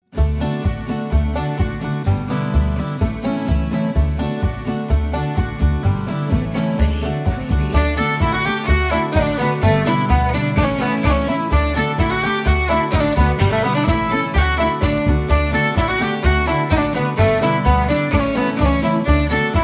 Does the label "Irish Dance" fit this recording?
Yes